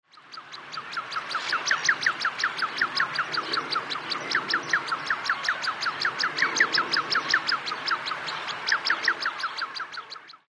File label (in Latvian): tevina balss; berzciems; a.celmins